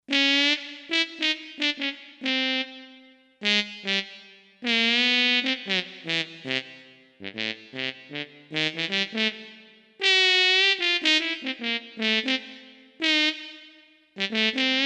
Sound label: M. TROMBONE, Roland SC-880; Factory Patches